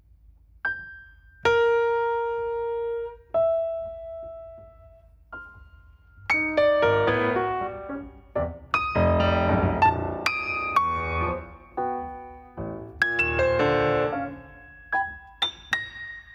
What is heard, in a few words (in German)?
Klavierstück; Klavierstück I Version C Take 1 Crux II in context